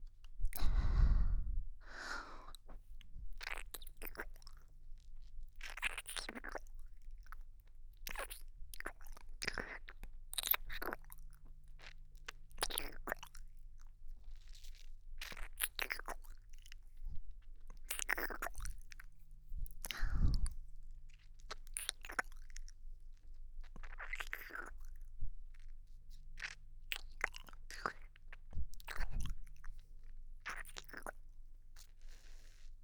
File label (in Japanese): 静かなキス責めコース